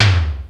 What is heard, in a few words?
DDR30 DT; Crypton - DrumBase5000; RZ1